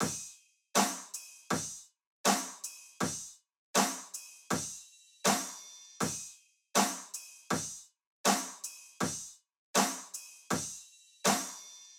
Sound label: Drum Loop 160 BPM Room, Future Bass; Drum Loops; Cymatics; GHOST (Beta Pack); Acorn